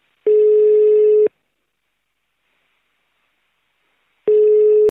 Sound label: incoming ring; means of communication